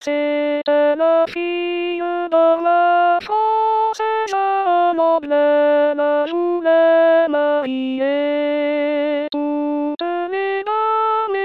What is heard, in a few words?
alto; fille roi francais